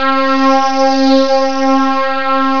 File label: Synth Lead; rare gems